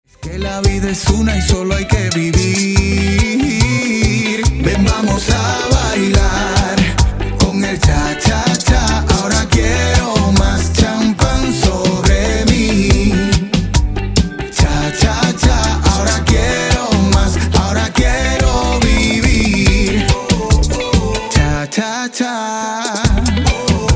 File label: Bachata Remix